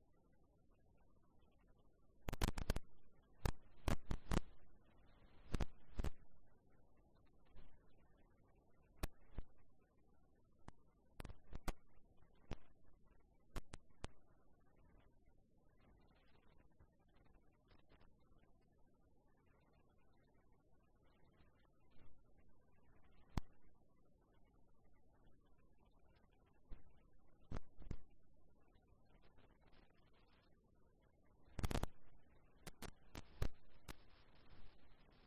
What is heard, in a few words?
riverside; Kyoto U; Ashiu